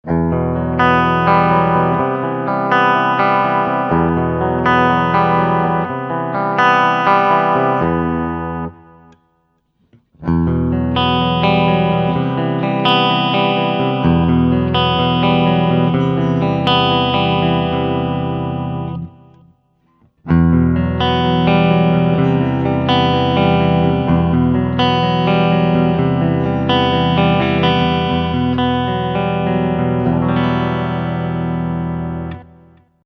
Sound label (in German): Pickup Fahrt Clean